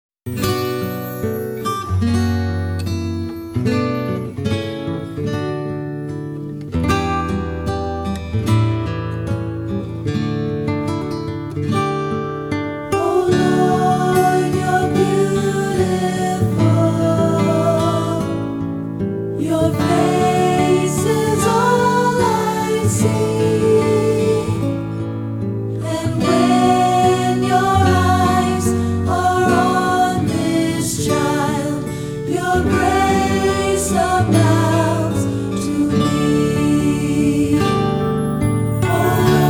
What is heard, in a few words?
acoustic worship